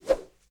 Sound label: Whoosh, High, Airy; Universal SFX